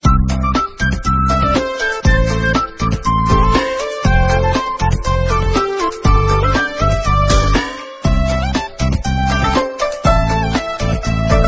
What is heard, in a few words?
bgm